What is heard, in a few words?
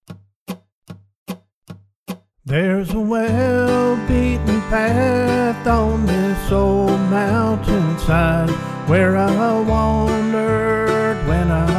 Key of G backing track with vox 75bpm